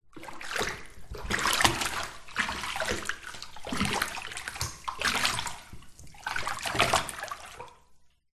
household bathtub shallow water body movements room reverb; zapsplat